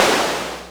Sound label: Clapbox Noise; Ableton Foundation FreePacks